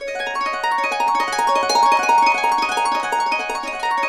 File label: DB, Harps; Complete Harps; USB Soundscan vol.62; AKAI